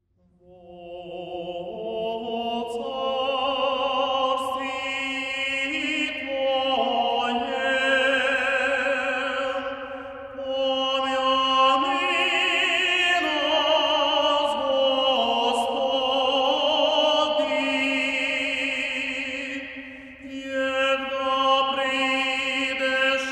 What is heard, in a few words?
Choir Liturhia; Kyiv